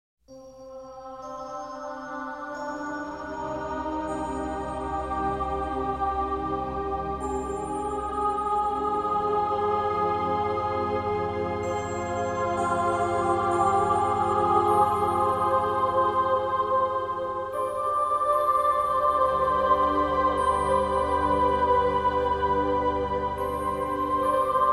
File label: piano, harp, flute